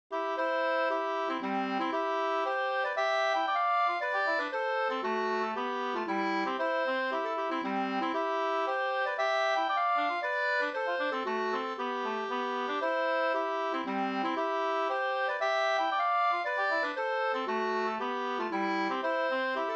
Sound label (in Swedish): polska Bodapolska